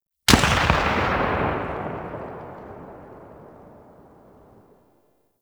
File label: Sniper Rifle Tail; Warframe; Tenno